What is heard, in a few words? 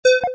door; Robot Wants Fishy